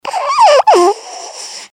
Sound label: baby snorts